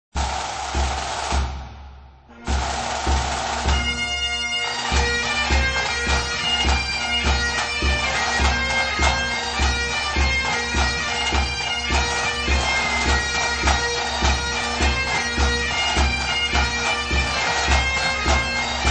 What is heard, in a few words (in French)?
musique ambiance britannique